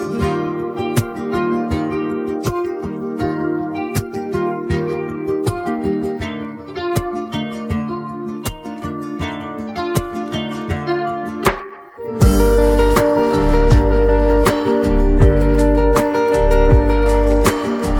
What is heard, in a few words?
Instrumental